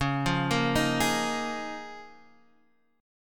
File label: Db 6th Add 9th Db